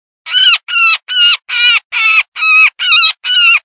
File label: Squealing Bird